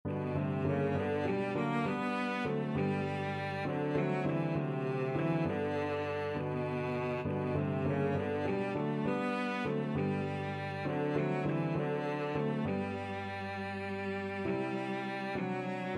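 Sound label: violoncello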